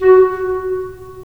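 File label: wind clarinet; LA Composer vol. 3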